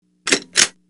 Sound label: pistol ready